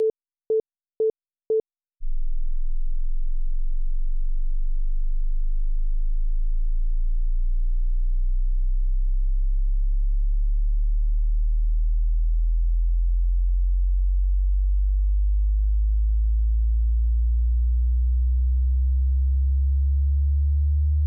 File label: sweep